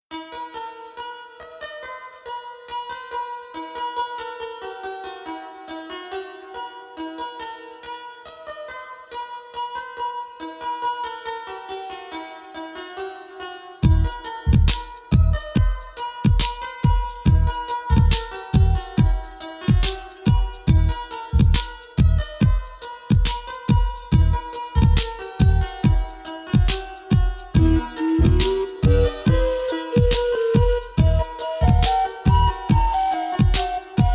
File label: sensual rhythm 432 hz